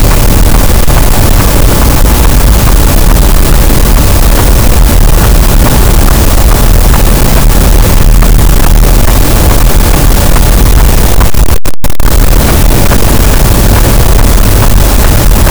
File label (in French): cachalot, gaussian noise; Physeter Macrocephalus